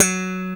BS BRITE B, Funk Bass 5str

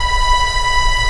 ANGELIC STR E4; AKAI S6000 CD-ROM - Volume 1; Strings

